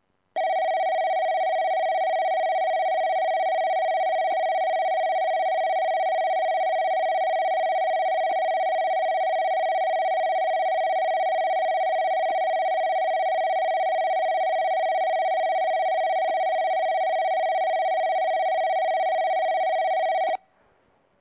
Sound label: kagoshimachuo bell